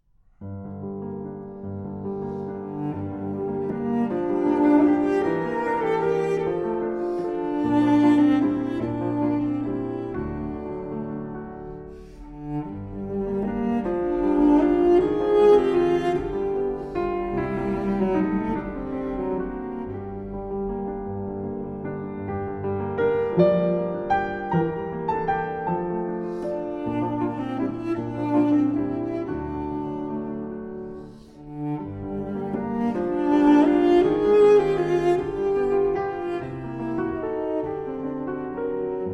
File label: Sicilienne For Cello and Piano; Fauré; Op. 78